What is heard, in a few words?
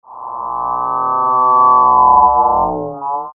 noise resonant sweep dry